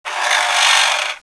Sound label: drill